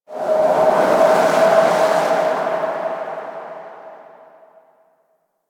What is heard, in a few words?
Exterior Forest Wind; Ambience; Duviri; Warframe